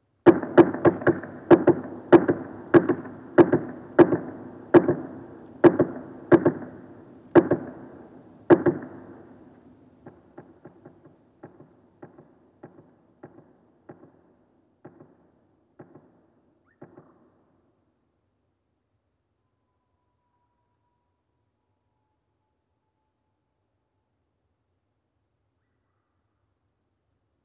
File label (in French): Pic flamboyant ralenti; Pic flamboyant moins deux octaves